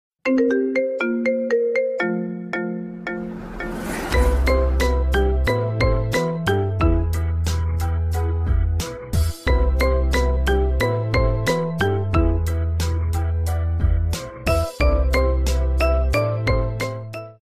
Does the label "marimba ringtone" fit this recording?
Yes